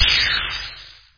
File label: dash; medievil 1